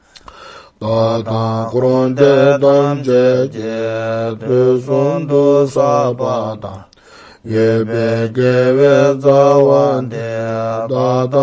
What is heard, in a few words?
Prayer